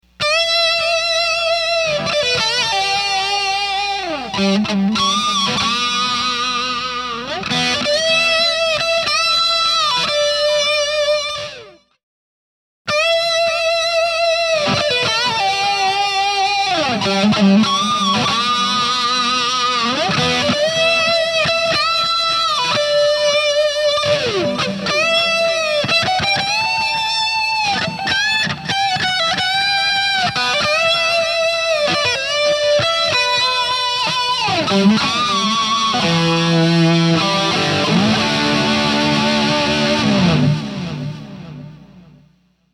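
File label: Solo HM2 alone compared to HM2 GE7 Mesa, guitar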